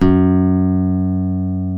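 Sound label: F#1 FLAMENCO, FLAMENCO guitar; WorldSounds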